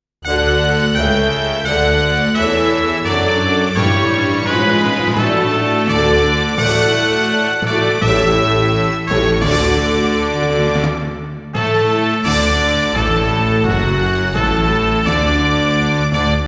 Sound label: brass band; ANTHEMS